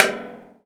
Thunder Drum

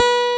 PICK B2, BassGuitar; AKAI S6000 CD-ROM - Volume 1

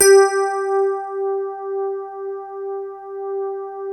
DIGI RHODES; Sound Gallery - Charged

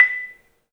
MARM, 3D MARIMBA; 3D Sound; 3D Keys VOL-1

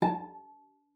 cello pizz A5; 90 s Sample CDs; Xsample VOL-5

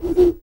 TUV BLOW, World Voices, TUVAN GROAN; Spectrasonics Vocal Planet CD5

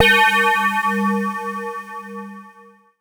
BELL-GONG, A#; Big Fish Audio

